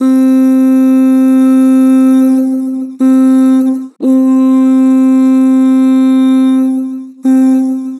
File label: Ooh, Vocals; Harmor